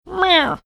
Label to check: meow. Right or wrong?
Right